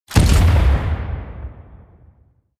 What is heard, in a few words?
cannon